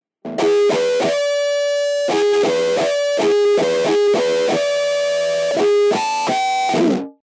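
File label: FUZZ INTRO SOLO, Guitar Rig; GR5 Presets – Rope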